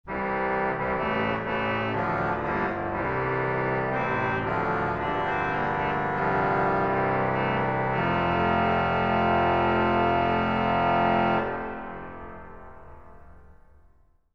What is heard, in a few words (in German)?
bombarde